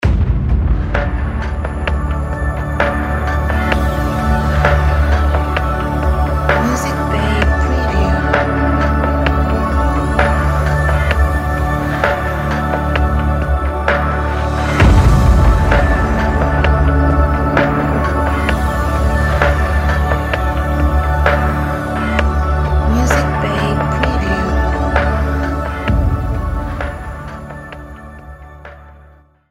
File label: Corporate Music Bed Loop